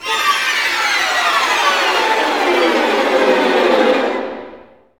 STR SEC, STRING GLISS; Roland - LA Composer vol. 1

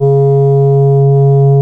C#, Synclav FM, WIND; Big Fish Audio

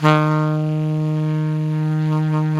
SAX ALTO MP, Alto Tube; Roland LCDP07 Super Sax

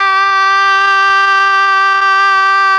OBOE; ILIO; Propeller Island Legendary M400

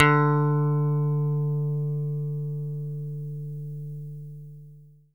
E-GITARRE, Alpine Volksmusik; Best Service

